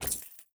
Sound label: take reward; Furcherro